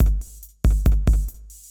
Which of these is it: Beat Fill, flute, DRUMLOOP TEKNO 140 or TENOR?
DRUMLOOP TEKNO 140